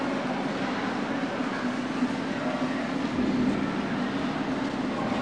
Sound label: plaza